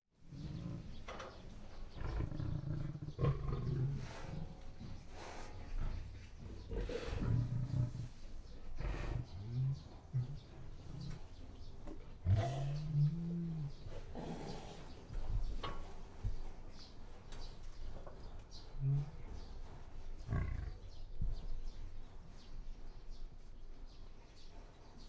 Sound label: Lion in Cage Growl Snarl Pacing Zoo Environment